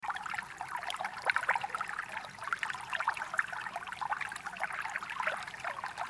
Sound Of Water

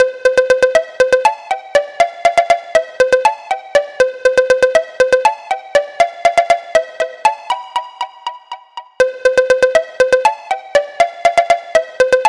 Jolly Ringtone

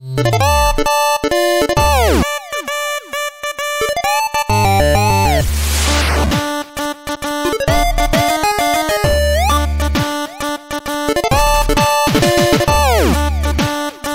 8Bits

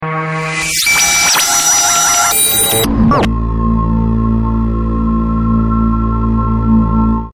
Scifi Beam

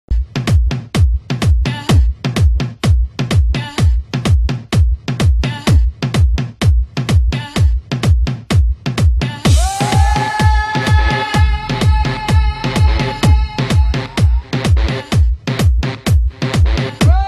Disco Dj

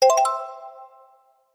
Jingle; DK64

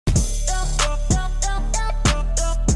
Marsh Walk SHORT

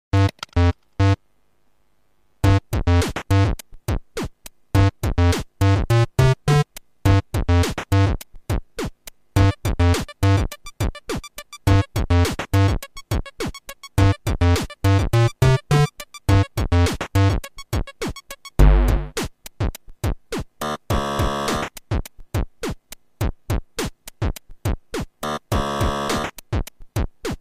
Gameboy Horror

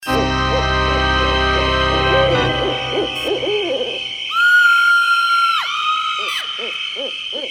Horror Night Sms

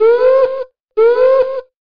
Woop Woop

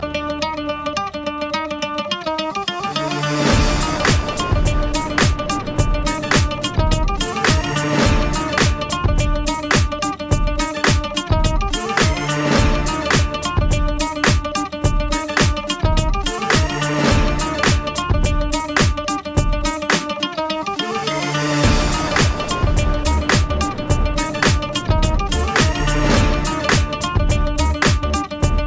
Instrumental

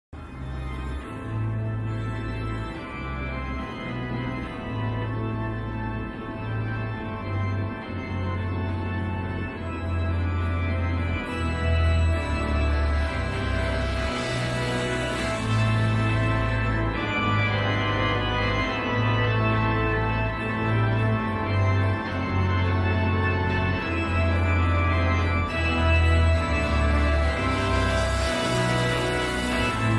Organ; mark twain

